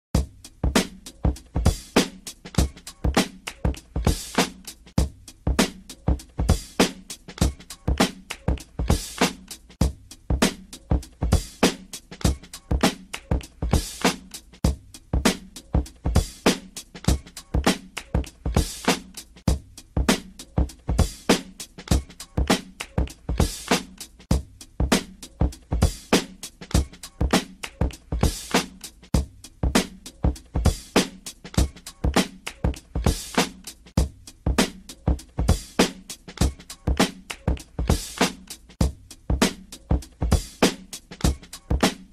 Break Loop, drumbreaks

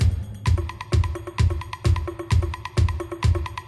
DRUMLOOP

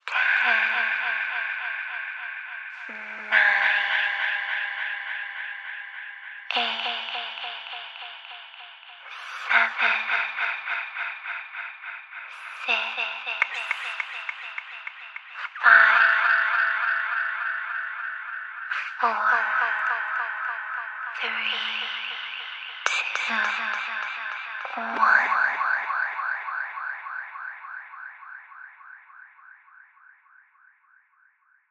asmr

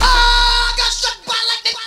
OOH SAMPLE

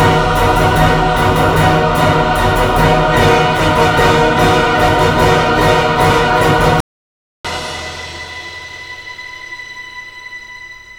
CHOIR STABS Gb

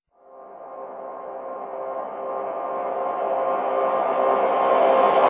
RISER

